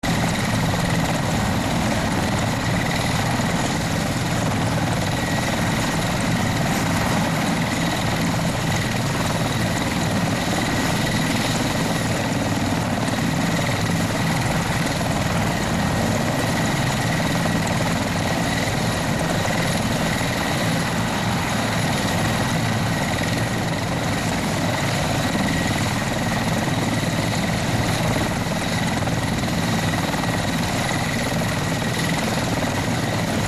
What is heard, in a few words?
mi35 engine